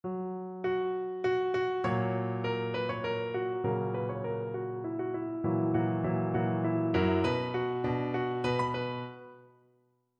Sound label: b minor, elaborate cadences; dressed up perfect cadence; For this project I was asked to write four examples of a dressed up perfect cadence.